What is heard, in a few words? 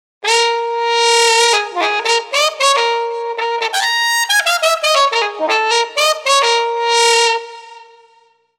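wah mute trumpet; TURBO VL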